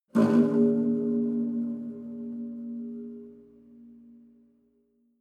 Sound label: low string buzz wobble